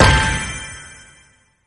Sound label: bomb